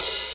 short open high hat sample a sharp key, open high-hat sound tuned to the A# note